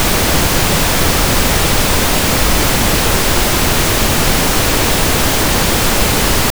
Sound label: pink noise